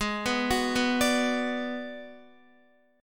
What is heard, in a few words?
Ab Minor 7th Flat 5th Ab, down, Guitar, 4E, 4A, 5D, 5G, 5C, 6F; arpeggiated; JGuitar